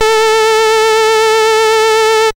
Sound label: SID Lead A; ChipShop Deluxe; ChipShop Hits